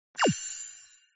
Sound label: WILD Particle Start; slotNTigerbundle